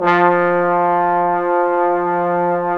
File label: BRS FF BON, Bone Sec.1; Roland LCDP06 Brass Sections